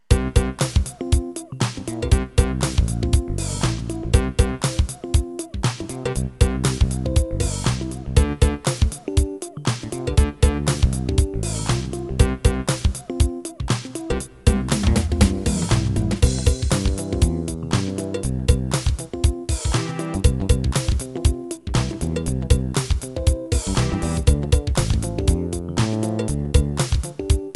Rap

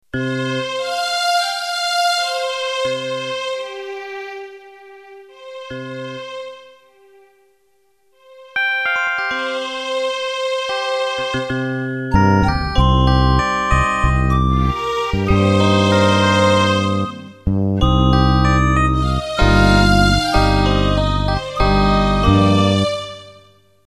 Animation Intro

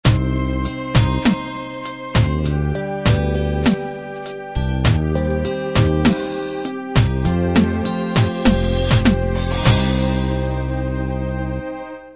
16 beat ballad end

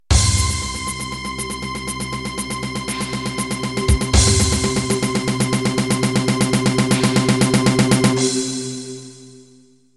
Tekno Intro

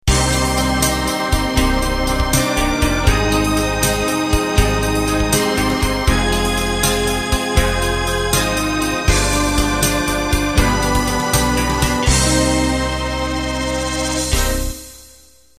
Slow Rock End; gentle, unplugged 6/8 or 12/8

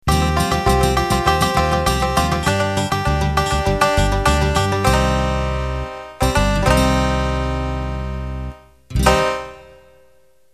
Cajun Country End

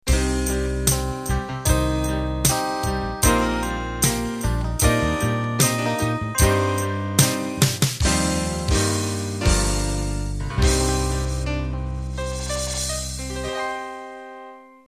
Cavern Pop End

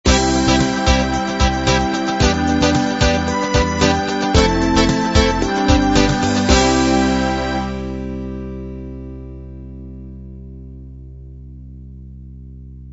pop ballad end

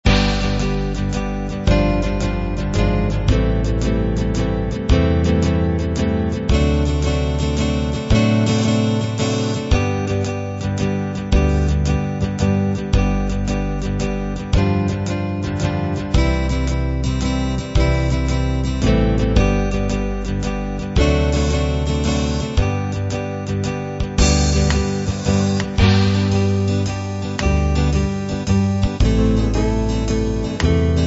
country waltz

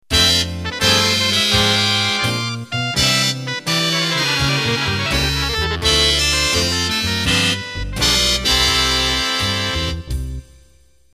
Big Band Slow End

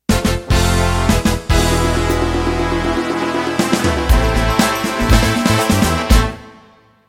Motown End

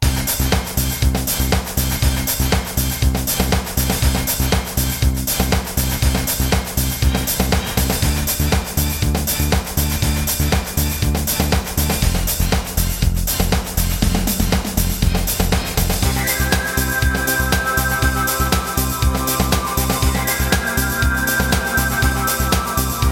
Tekno

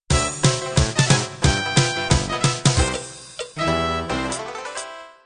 dixie end

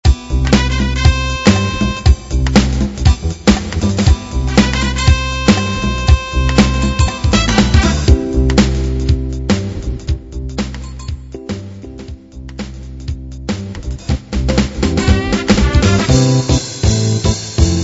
Jazz Hip Hop

